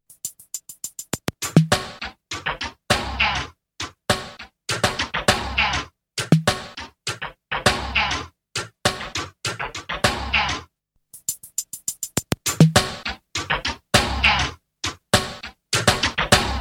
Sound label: Synth Percussion Loop